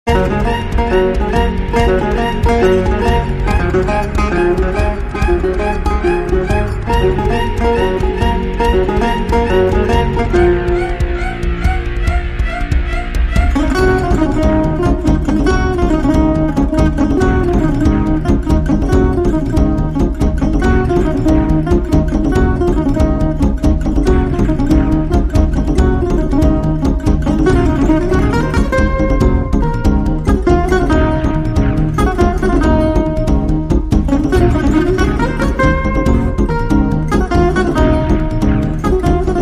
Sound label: Suspense